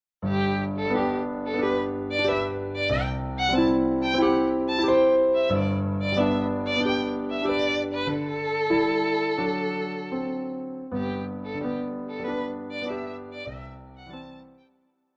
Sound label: Humoreske for Violin; Dvorak; Op.101 No. 7